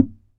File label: SAZ TAP; Spectrasonics; Hans Zimmer Guitars VOL-2